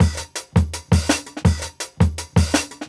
166, Jungle Breaks; 90s Sample CDs; Original Jungle Breaks

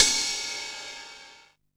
Ride, Drum & Bass, Hats & Rides

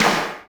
DANCE SET, DRUMS

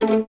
Violins A 3 Pizz; String Ensembles; Miroslav Vitous; 11 Violins

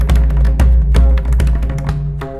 MRIDAN, Indian Traditions; USB Soundscan vol.45; AKAI

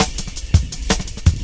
Drum Loops, Jungle

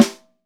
SN, LUDWIG SNARE

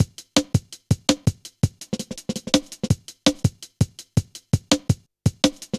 Skippy, Drum Loops, Jungle

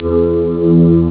Choir; ST-XX Amiga Soundtracker Sample Packs

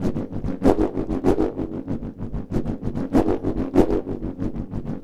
Wind Wand Pulse, Ethnic Percussion; Sonic Foundry (Sony Creative Software); Ambient Atmospheres & Rhythms

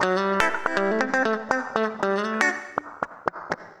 Acoustic Guitar Loops, 120

